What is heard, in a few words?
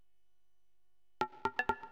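percussion, house, 125; eJay; Drum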